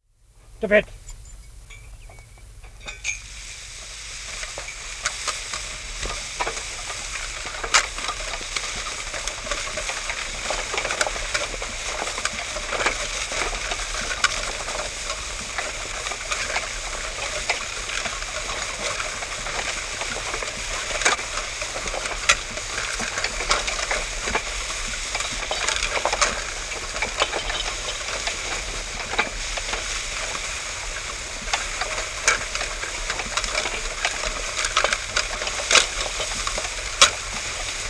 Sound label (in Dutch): hooihark machine met paard